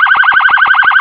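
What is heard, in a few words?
emergency vehicle phaser, sirens; canada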